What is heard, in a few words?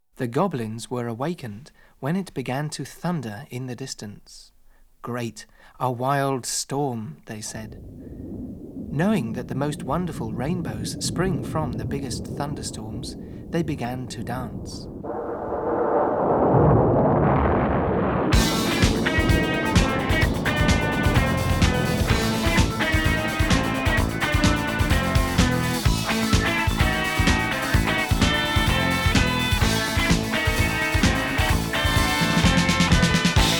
Thunder Storm